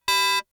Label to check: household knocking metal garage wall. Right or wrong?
Wrong